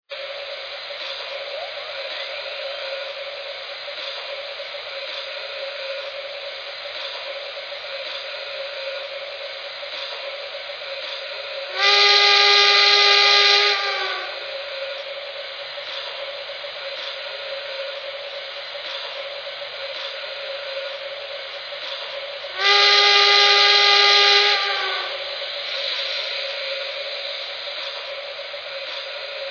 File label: alleghny